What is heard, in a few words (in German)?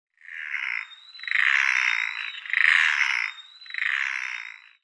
Noddiseeschwalbe Anous stolidus, Vogelstimmen